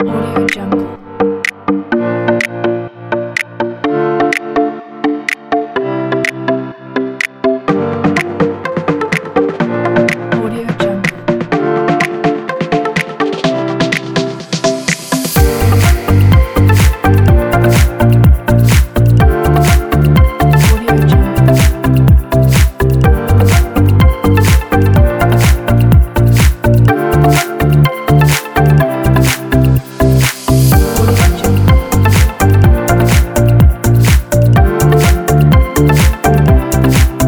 summer tropical pop

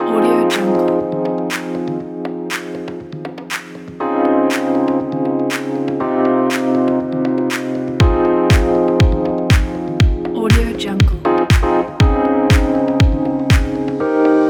fashion house background

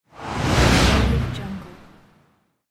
whoosh

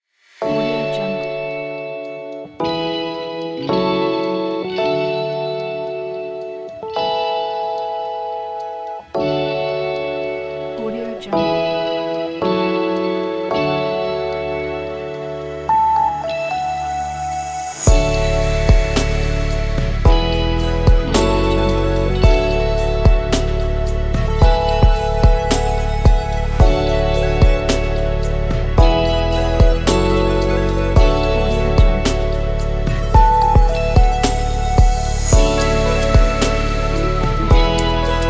ambient

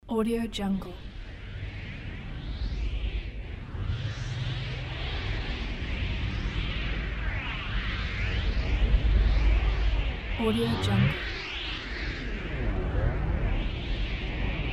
space wind

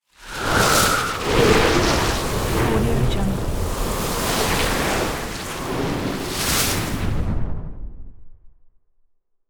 elemental sand cast; soundmorph

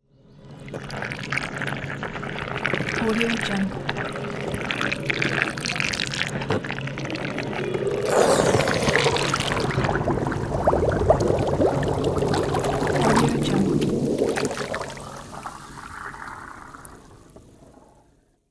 swimming pool cleaner bot; Robotic Lifeforms 2

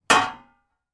pot clank put down metal lid on pot handle

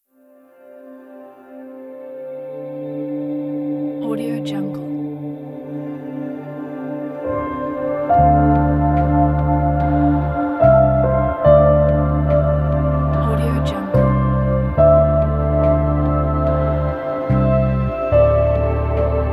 mysterious piano ambient

